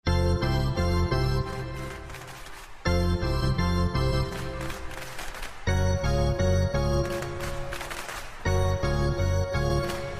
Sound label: piano